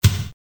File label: kick